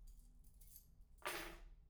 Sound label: keys; IEEE AASP